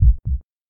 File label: Heart Beat